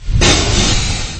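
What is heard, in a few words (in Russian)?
усиление парового потока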